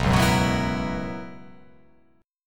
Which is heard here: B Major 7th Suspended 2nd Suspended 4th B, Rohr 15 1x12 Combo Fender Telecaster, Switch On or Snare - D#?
B Major 7th Suspended 2nd Suspended 4th B